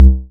modular tonal, Kickbank; Ableton Foundation FreePacks

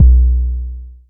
analog tonal, kick; Ableton Foundation FreePacks

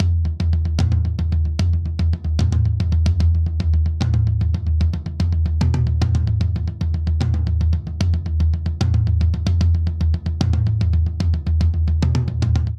Triplet Hip Hop Toms Only 75 bpm; 4 4; MIDI Clips; Ableton; Factory Packs; Drum Booth

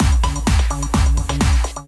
Svensk House Kit groove 128bpm

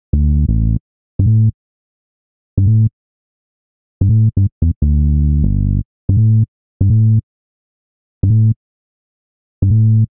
Beat That Bass A Minor 85 bpm, Bassline; MIDI Clips; Tonal; Ableton; Factory Packs; Chop and Swing

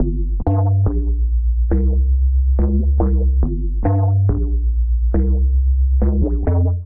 Add Square Shifter F Minor 140 bpm, Bassline; MIDI Clips; Tonal; Skitter and Step; Ableton Factory Packs

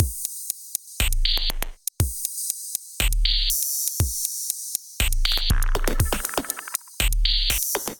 Kit groove 120 bpm, Glitch and Wash; MIDI Clips; 89 - 120 bpm; Ableton

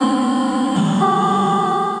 Chromatic Granular Voice, Transformed Voices; Ableton; Factory Packs; Voice Box

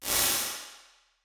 EMT-style Plate, Plate Reverbs; Convolution; Kontakt 5

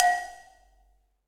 Cowbell Mid Low Loud Squashed; Drum Hits; Ableton; Factory Packs; Session Drums Club; Standard Hits

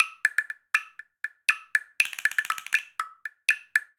Wood A, Latin Percussion; Instrument Kits; Ableton; Factory Packs

